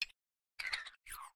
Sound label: spin, copper golem weathered; Minecraft